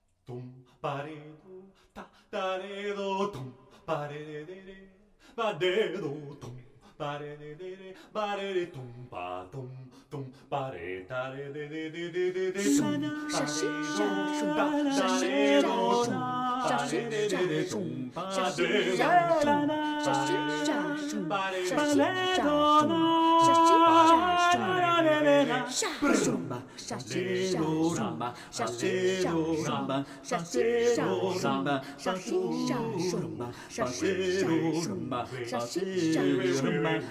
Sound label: Tango